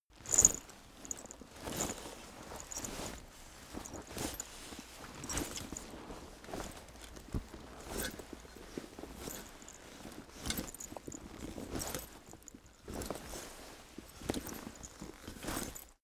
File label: leather metal movement soft very slow